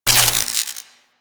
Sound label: Mag Spark; Archwing Intro Cinematic